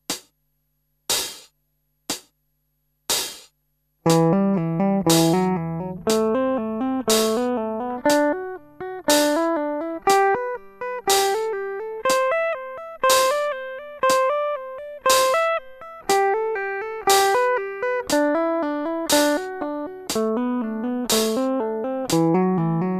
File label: finger medium